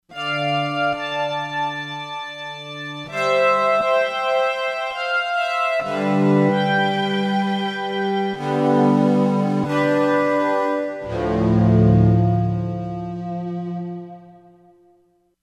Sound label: Analog Str, Korg MSC-13S; Korg MSC-13S Ethnic 2